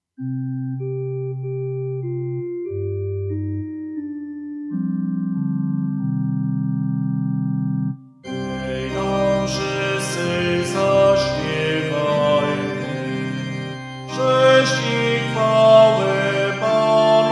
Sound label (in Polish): hejnal